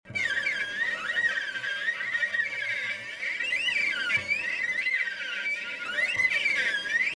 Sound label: D string cello harm. gliss.; D string cello e.d. harm. gliss.; fivefingers